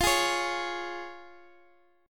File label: F Minor Sharp 5th F down Guitar Standard; Fm#5 strummed; JGuitar